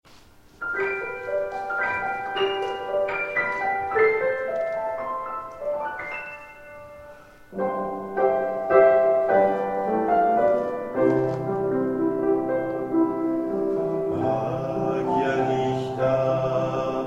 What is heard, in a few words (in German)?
Stille Nacht griechisch, chor; stuttgart